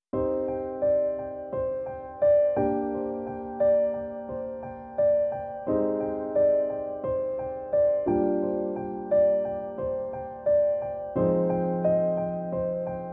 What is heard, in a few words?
Piano Only